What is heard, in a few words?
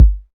studio kickdrum g key